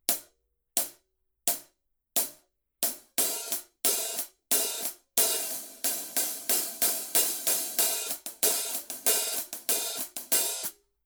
color sound 900 14 purple heavy hi-hat pattern, paiste